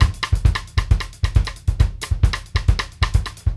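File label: X-STICKS, Brazil 132; Sampleheads; New York City Drumworks VOL-1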